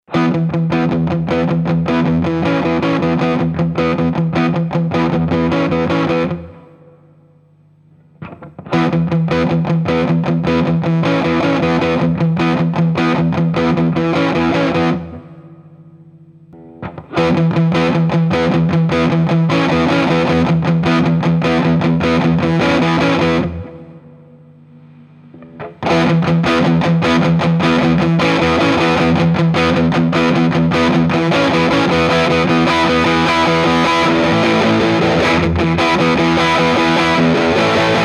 G-2 increasing sustain

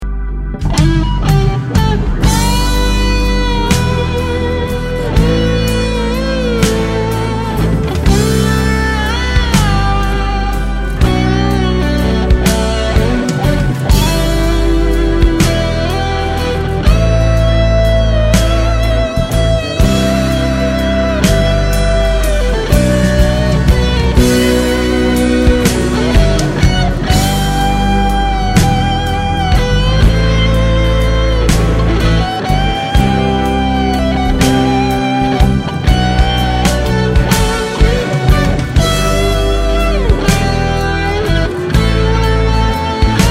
Solo Dry Mix

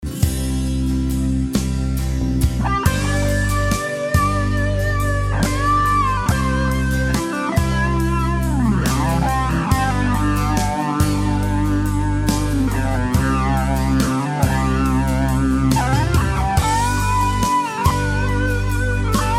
Ram Muff; Nova Delay; Solo with backing track

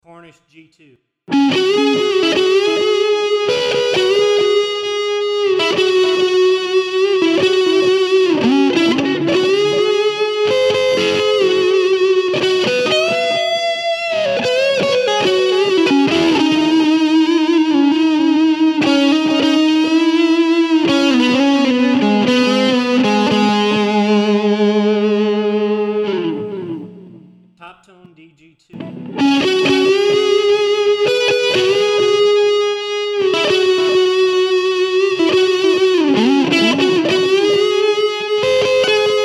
G2 vs DG2 Gdansk tone

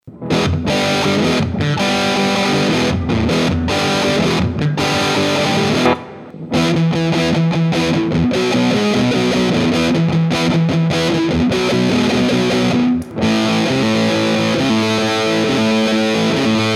RAW CLIPS EMG TWIN RHYTHM; RAMS HEAD

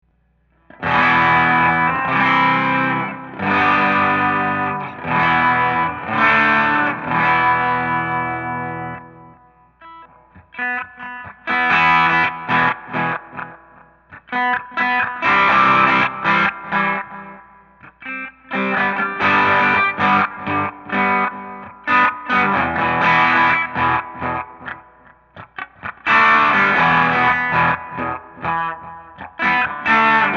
Colorsound, Pigs ADT

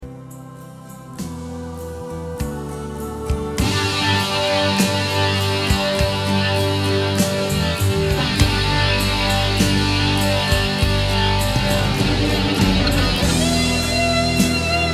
Solo Bassman EMG

